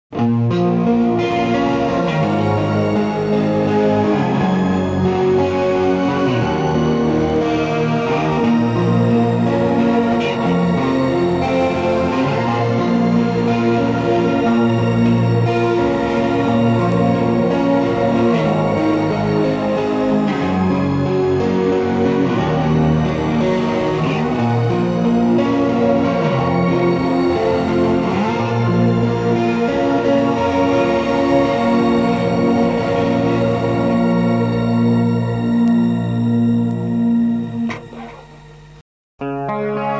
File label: shimmer, guitar